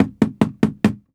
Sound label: knock; AKAI S6000 CD-ROM - Volume 6; Daily Life